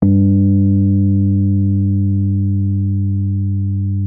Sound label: MK1 G2, RHODES; Xsample VOL-1